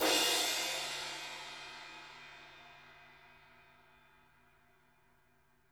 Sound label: CRSH, CRASHES